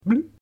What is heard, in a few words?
bubble Open